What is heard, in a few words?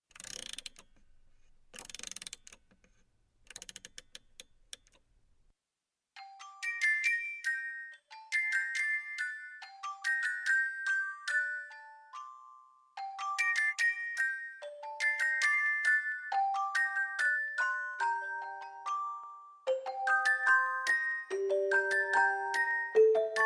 Music Box